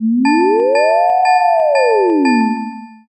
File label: firetruck